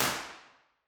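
council chamber impulse response, York Guildhall council chamber; b-format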